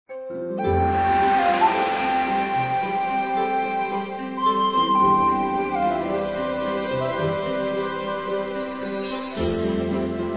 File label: BGM Adventure Start